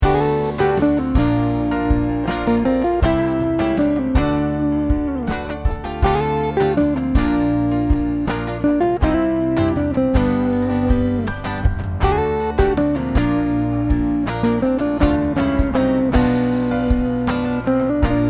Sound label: Pop rock groove